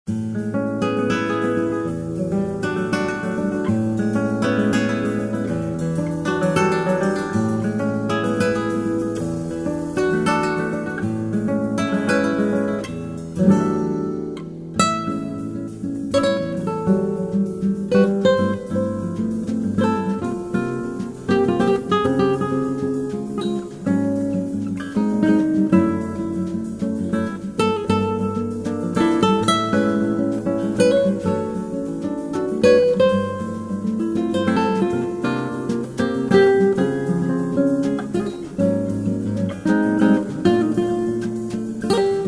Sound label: samba; samba noel